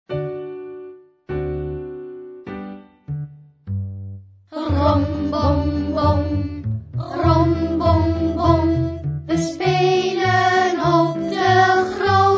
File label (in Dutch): trommel